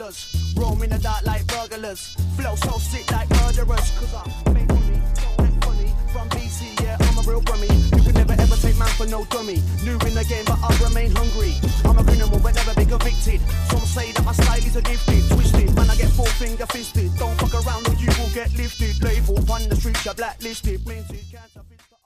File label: nokia ringtone; nokia edge s